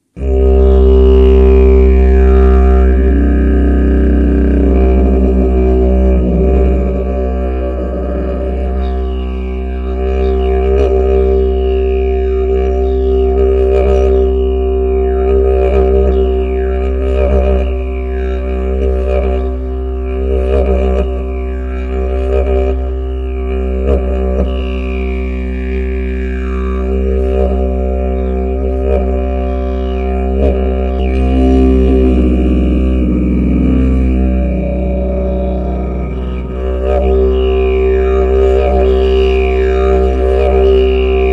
didgeridoo do lungo

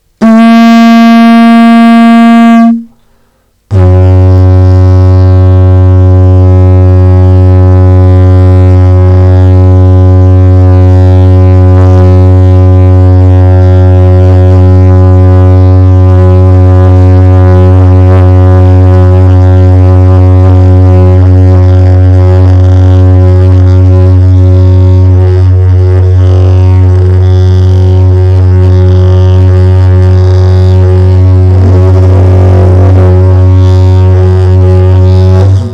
didge fa

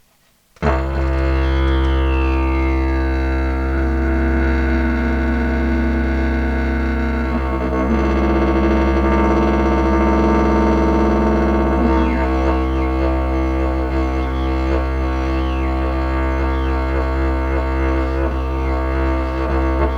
didge do 65hz

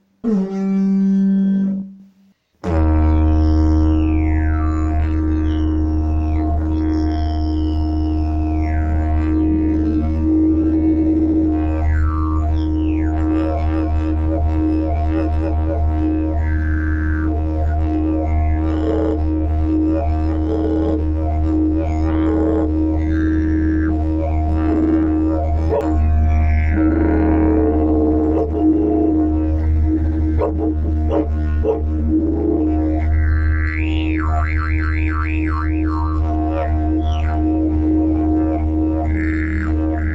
didge mib

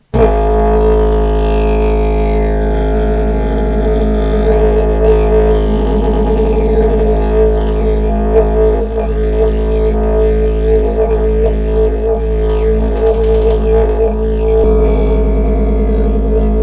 didge la 54 hz 432

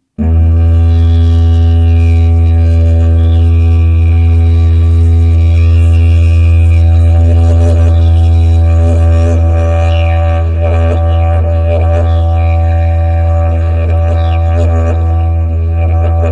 didge mi

